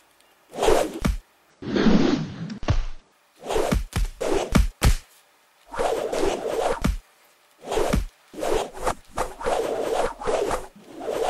Club; چماق

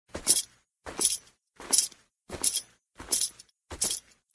Cowboy boots; چکمه کابوی

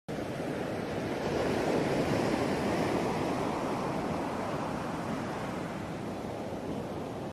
ocean at night

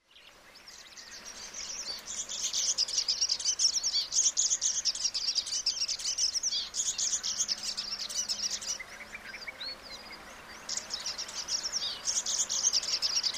Baya weaver

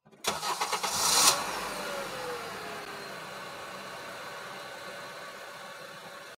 Pressing car switch

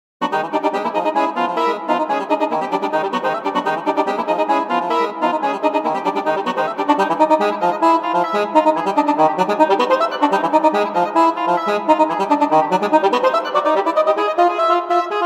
Heckelphone

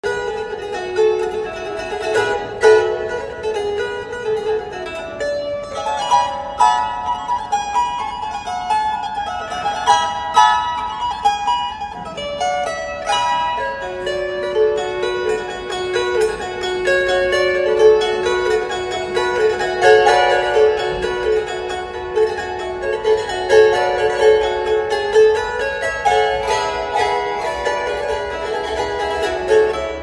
Gusli

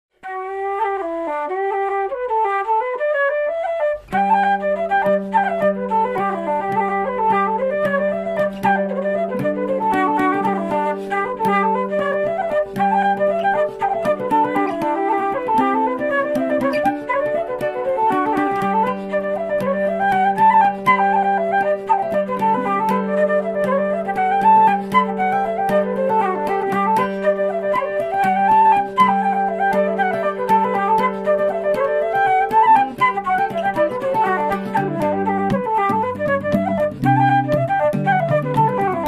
Irish flute; فلوت ایرلندی

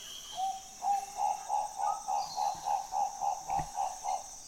Guinea turaco

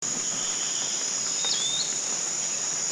forest elaenia; پرنده الینیای جنگلی

